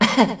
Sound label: coughing; rosalina; SM64 CO-OP